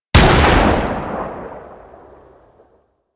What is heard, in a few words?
Laser Aim Rifle Shot, Weapons; Grineer